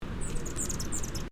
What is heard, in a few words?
evelynae scolding